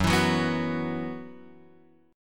F sharp 7th Suspended 4th, Guitar, Open F; F#7sus4 strummed

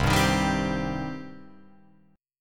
C Minor C down Guitar Open F; strummed; JGuitar

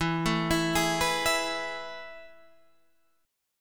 A Major 7th Suspended 2nd E

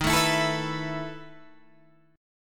B 11th Eb, chord

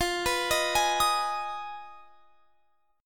F Minor 7th Flat 5th, chord; Fm7b5; arpeggiated; x,20,21,20,21,23

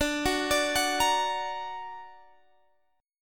D Augmented D down Guitar Standard; D+ arpeggiated; JGuitar

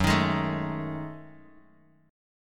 Gb Minor 6th Add 9th Gb Bass Standard; strummed; 14,18,18,14; JGuitar

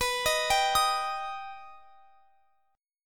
B Augmented B down; arpeggiated

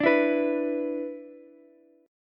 B-Minor Double Flat 5th, Ukulele; Bmbb5 strummed; JGuitar

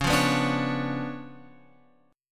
C sharp 7th Flat 9th, Guitar, Open F; C#7b9; strummed; guitar chord

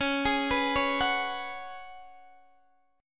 Db 7th Suspended 4th Db down Guitar DADGAD

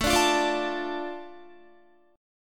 A Suspended 4th Sharp 5th A Guitar, chord; Asus4#5; strummed; 4F,4A,5C,5G,5C,6E; JGuitar

